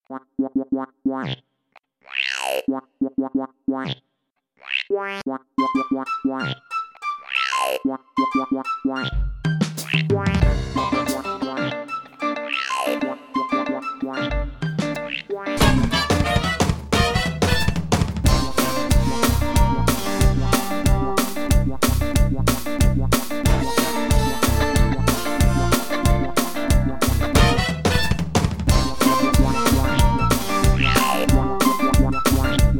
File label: Hypno Bleep Flute Thing; JoosTVD; For Your Pleasure Vol1